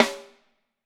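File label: snare drum, Drums; Bob Clearmountain Drums I; SD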